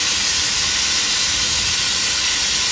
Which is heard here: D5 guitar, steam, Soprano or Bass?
steam